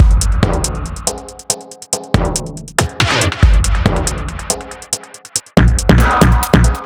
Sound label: Astro Base B, 140 bpm